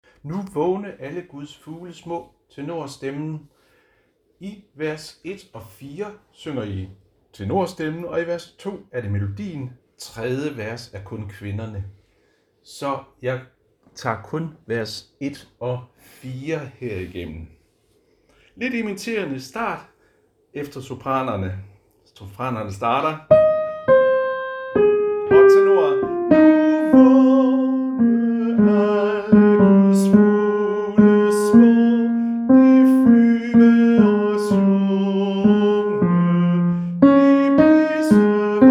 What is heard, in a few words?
Tenor